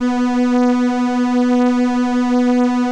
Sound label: ELKA, String Machines; ELKA RHAP